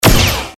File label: robot gun shot